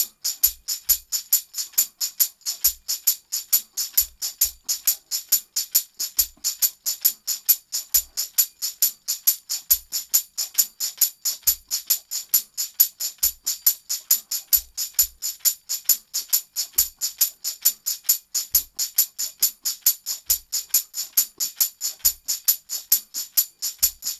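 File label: TAMB, tambourine; Drumdrops In Dub VOL-1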